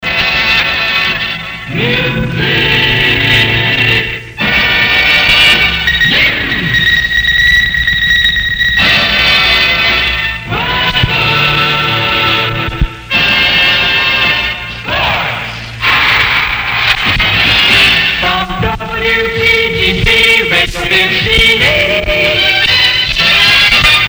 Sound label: jingles music news weather sports; futursonic